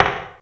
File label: CRACKED RIM; Dance Set 1